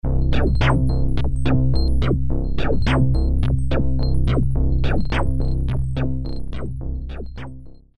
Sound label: Complex Seq